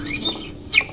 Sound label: VIO MISC, MISC VIOLINS